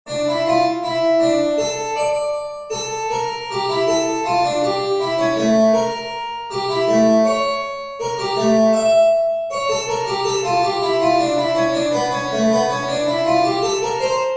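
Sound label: harpsichord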